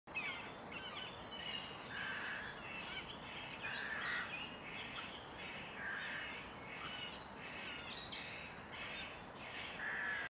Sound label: forest night sounds